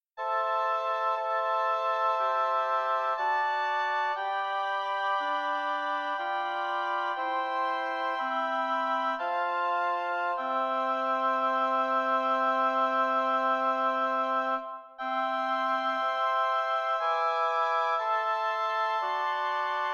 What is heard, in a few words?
lyrical oboe quartet